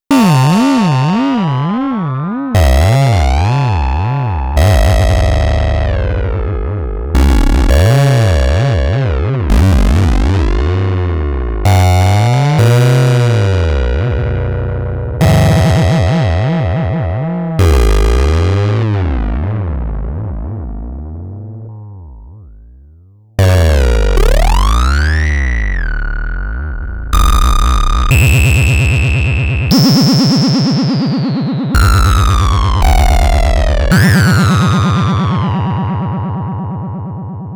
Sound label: Behringer System 100 Ring Mod Noise